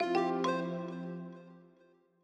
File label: Notify System Generic; Longhorn Ten Alfa Sound Scheme by David Mounder